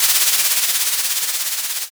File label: drawing